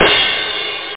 clash, drums

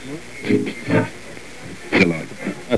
tuning, radio; Crosscountry USA Photo Safari

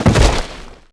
fall infant

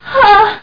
pant

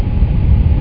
FAN; Monster Media Number 15

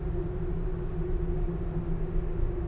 metal; Thief

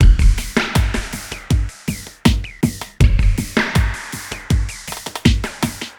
DRUM LOOP ambient 160

